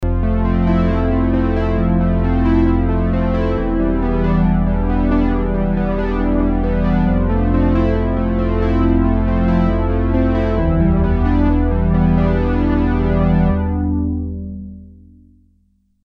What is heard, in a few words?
Juno106; boss